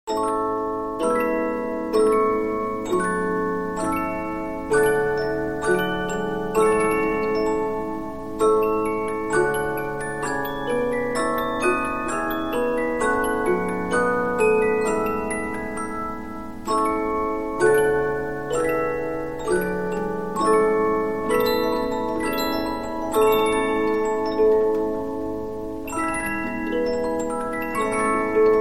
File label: Polyphon, 15inch disc musicbox